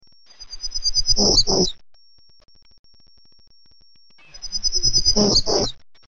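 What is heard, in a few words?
Townsend's Warbler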